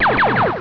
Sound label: pulse